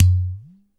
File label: POT DRUM, UDU